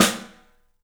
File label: STEEL S, drums; 3D Sound